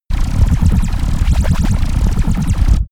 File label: space laser blaster cannon damped; soundpool